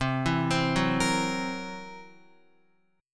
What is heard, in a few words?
C 7th Flat 5th C down Guitar; C7b5 arpeggiated; JGuitar